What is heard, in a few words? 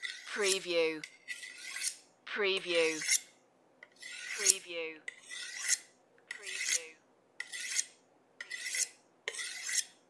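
Sharpening Knife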